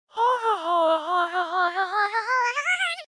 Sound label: funny chipmunk message notification sound effect